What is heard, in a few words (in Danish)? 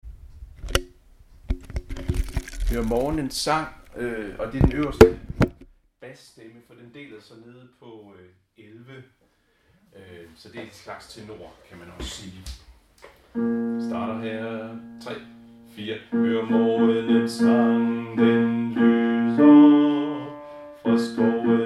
bas, harlev koret; Hør morgenens sang